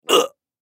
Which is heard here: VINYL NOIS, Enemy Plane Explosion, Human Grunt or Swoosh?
Human Grunt